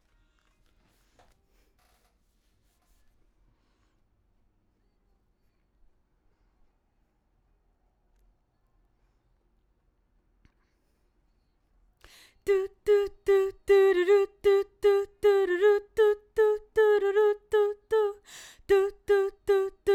BG Vox

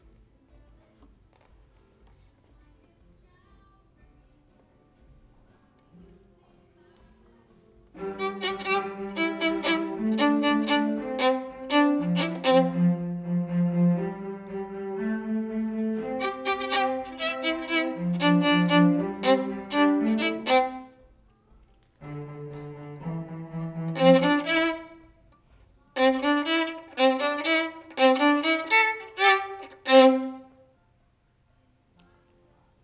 Violin

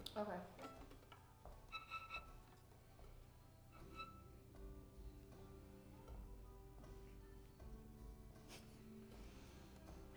violin

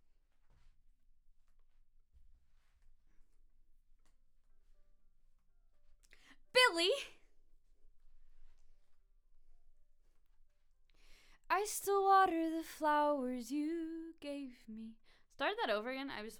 Vox

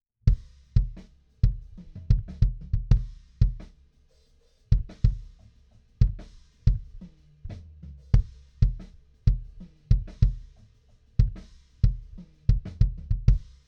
Kick

